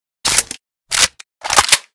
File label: insert longer, weapons; Armsel Protecta Reanimation; librarian striker